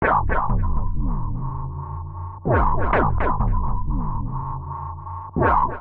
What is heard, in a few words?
A# Minor 165 bpm, bassline; MIDI Clips; Tonal; Eon Flux; Skitter and Step; Ableton Factory Packs